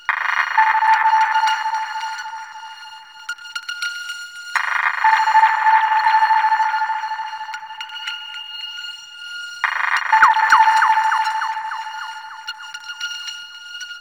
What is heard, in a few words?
Hack Transmitter Device Loop; Warframe; The New War; Narmer Hack